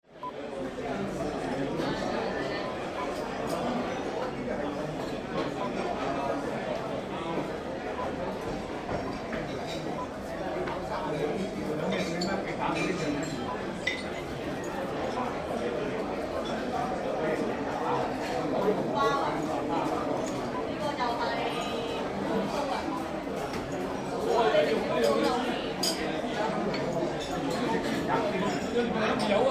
Chinese Resturant Ambience Hong Kong

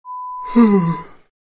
Yawn sound

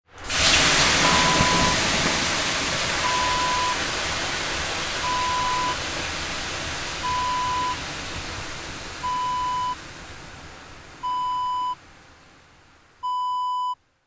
sauna water pour

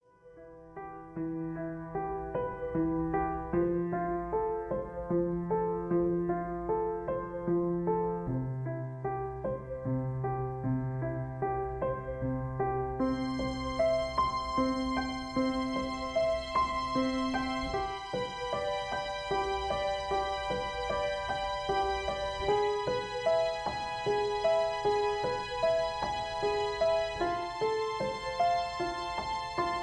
Instrumental

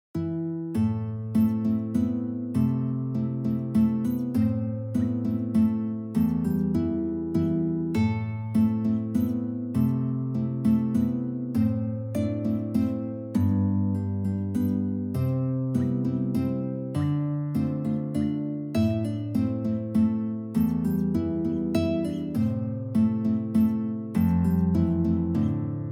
Guitar Quartet; Spanish Ladies

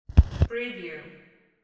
record needle set down

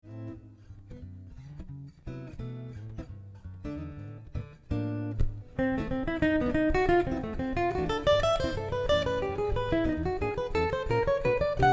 melodic line triads jazz impro

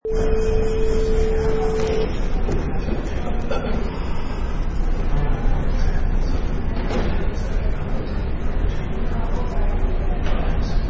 Airport Ambience

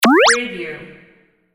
button

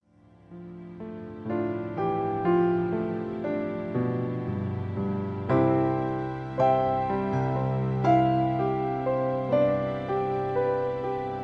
Classical Sea

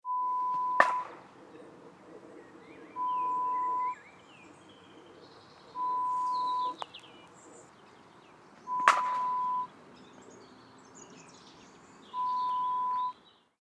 Cricket Match sound

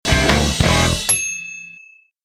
Hard Rock Power Chord

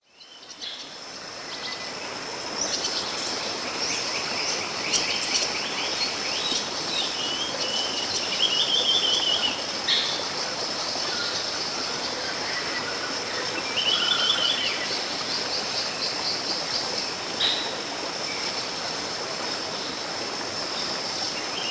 Waterfall Jungle Sounds

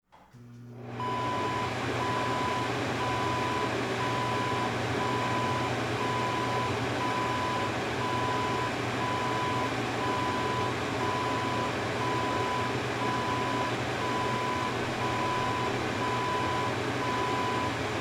bathroom fan

